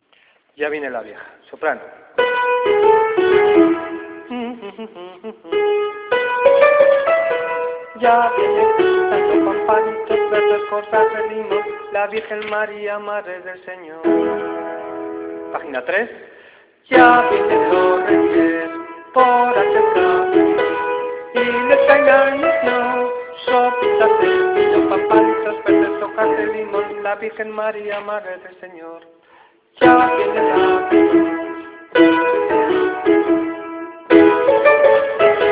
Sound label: sopranos